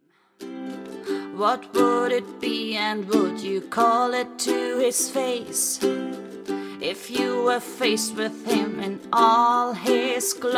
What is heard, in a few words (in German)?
ukulele gesang